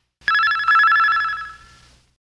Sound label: Globo Plim Plim